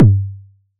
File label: Toms High